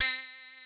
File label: ATTACK LD C4, Analog; USB Soundscan vol.49 - Analog Fantasies; AKAI